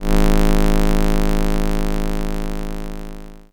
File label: Computer buzz; Electricity; Thalassophobia Restored